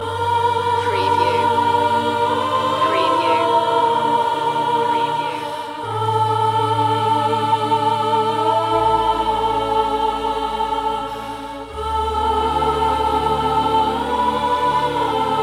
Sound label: chorus blue loop, Gloomy Royalty Free Music Loops; gloomy, sombre and pensive